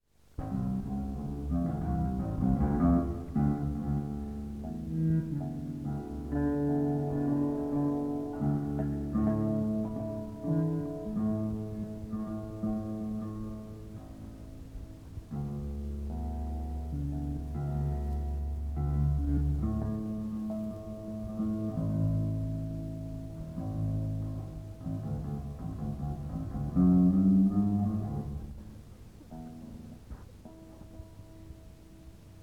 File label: Tuning & Soundcheck